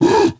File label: kingconga hit; Crypt of the NecroDancer